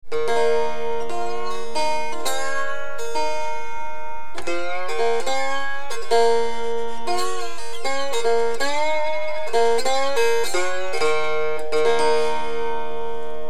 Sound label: tin guitar; junkboxinstruments